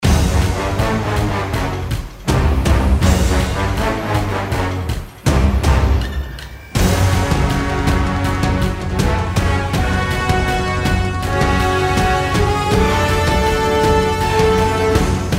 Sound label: Ring Tone; Hulk Coaster